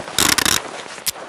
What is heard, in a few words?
healing; cstrike; medkit